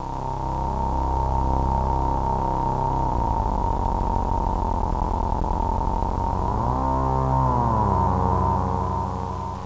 DETUNE, Siel DK-600

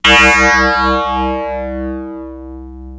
RAZORBASS C3, Yamaha TX-81z

